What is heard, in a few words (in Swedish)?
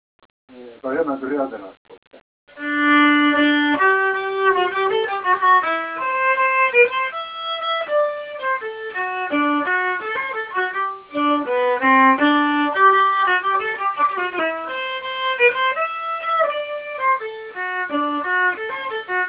polska; Brodernas polska; Arkivinspelningar